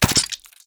HitByShotgun; We Are The Dwarves; SwampJumper

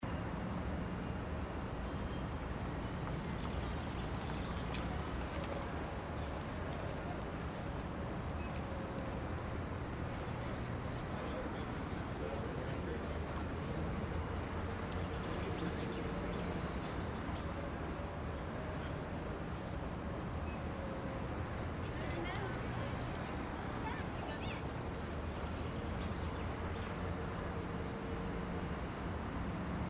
City Environment (SFX); U.B. Funkeys

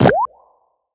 Box Respawn; Nicktoons Nitro; arcade

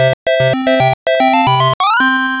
Game Victory

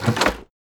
door

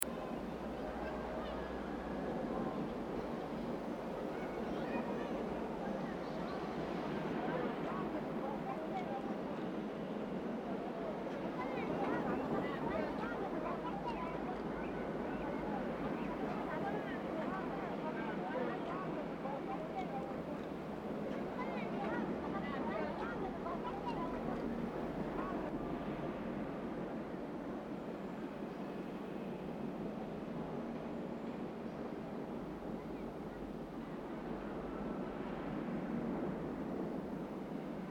Naboo City

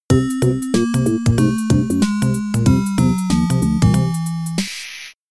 Ruins Game Over; brian the lion; amiga

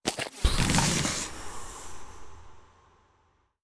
Acid Worm Deactivate; we are the dwarves gamerip 2016

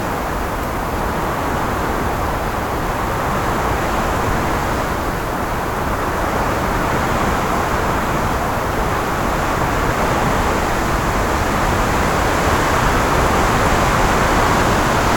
wind level; Level1 Ice Cliffs

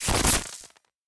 Glitch

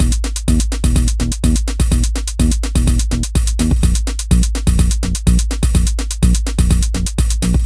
Random Dancing

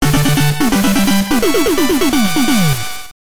Bonus Stage Win; Gremlins 2 The New Batch; gameboy

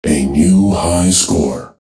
A New High Score; bejeweled 2 deluxe

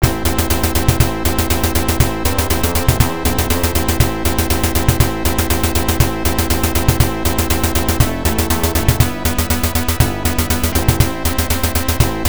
Scene Clear; Rambo III; Master System

